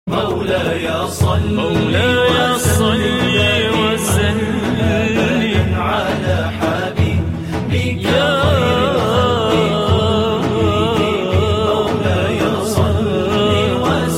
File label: islamic